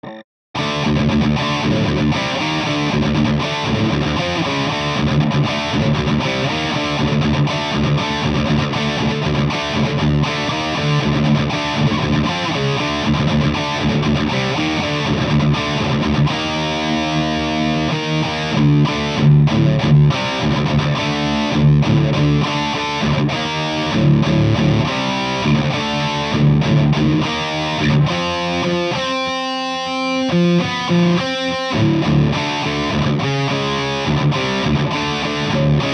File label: drop D thrasher; overdriven